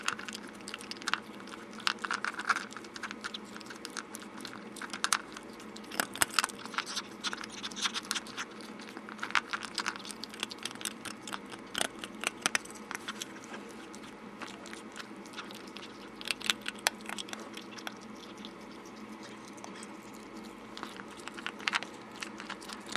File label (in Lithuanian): valgo sausa maista; nesusije su balsu